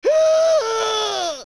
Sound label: Cursed Spider Scream; Zelda; OOT